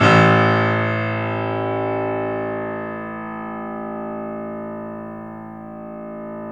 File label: A, The Piano; InVision Interactive - The Piano